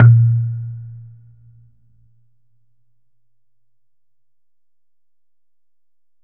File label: COCONUT WET; Q Up Arts; Denny Jaeger Private Collection